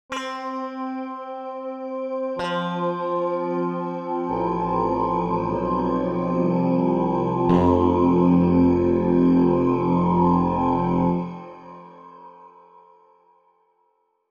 telecas bass setup synth flange

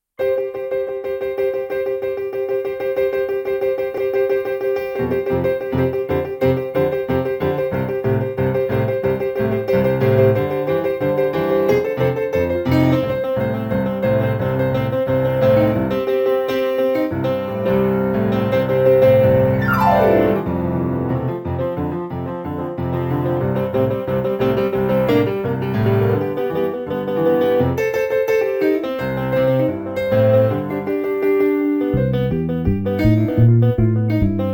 pc3 rock

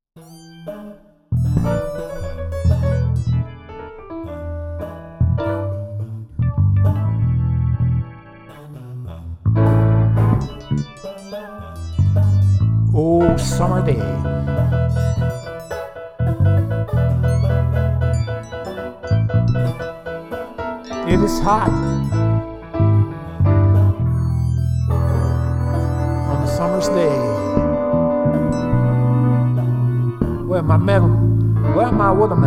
blues

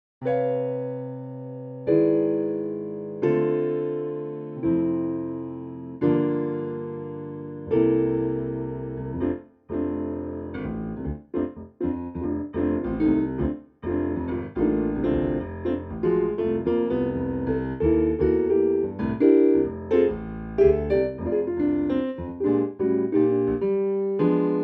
jazz pia; Kurzweil

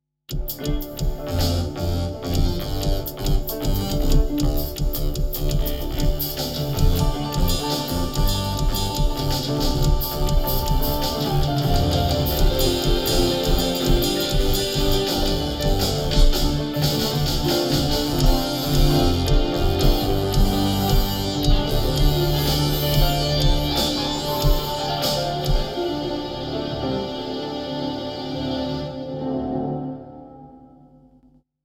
synth; Kurz

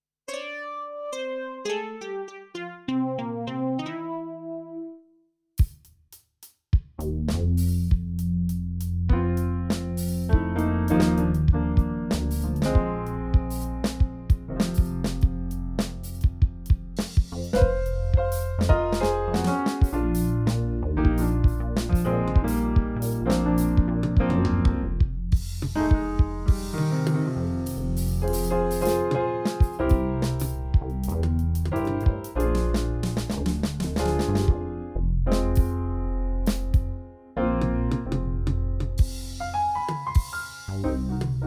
blues

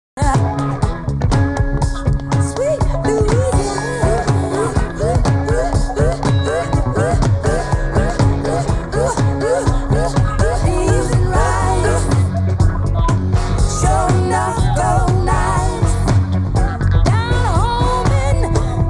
funk

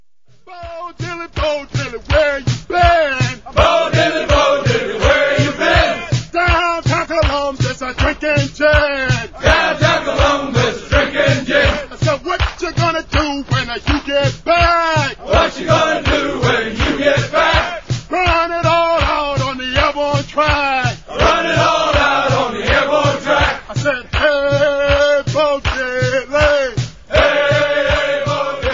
chant

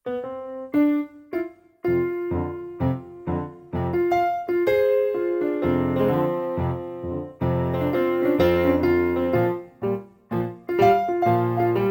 pc3 rock

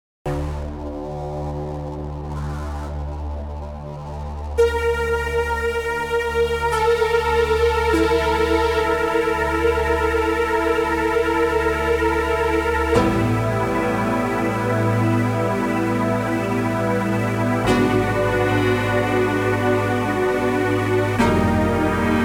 3 synths marshall

